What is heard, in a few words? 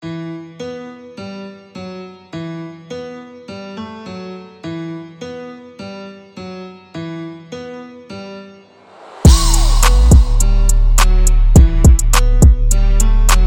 beat